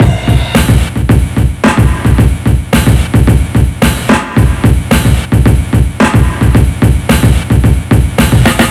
Night Rider A, electronica

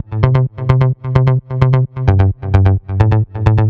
Basin, electric jazz

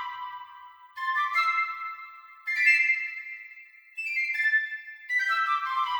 Piccolo; film music; woodwind; духовые инструменты; Сэмплы струнных инструментов (Movie Score); 80BPM

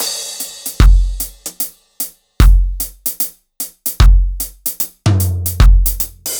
Driven Kit; Reggae (Регги)

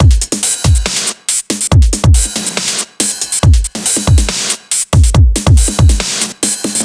Bitter, 140 bpm

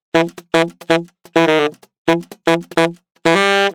Sax Solo; Disco House

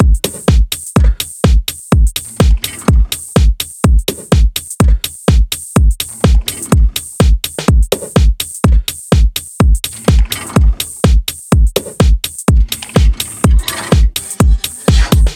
Fridge A, minimal

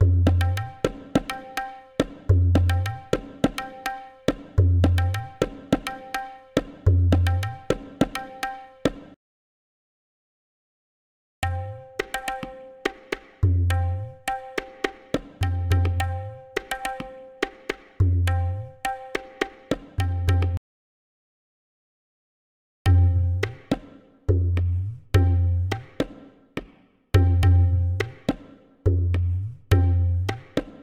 Indian Tabla 105 BPM

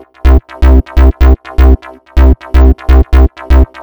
Blue Bass A; Сэмпл Bass (Dance, Electro); 125BPM; Loops; БАС

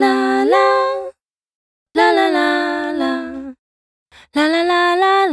La La Double

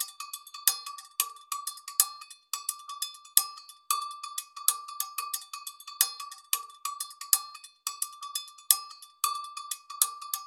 Cowbell Funk 90 BPM; коровий колокольчик

